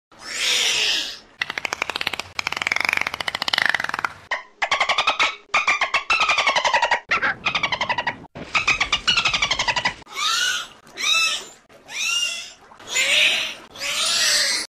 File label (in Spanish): EFECTOS DE SONIDO DELFIN